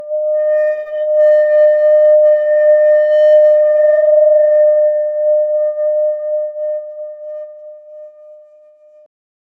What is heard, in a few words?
evacuate building siren; evacuation